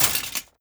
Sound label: step, footsteps, player, metal chainlink; Half Life Alyx